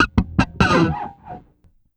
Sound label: scrape, bass; Sampleheads; Ultra Freakin' Bass; EFX